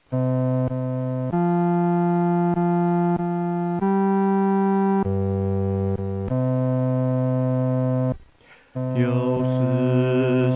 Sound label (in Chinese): 独唱 第四声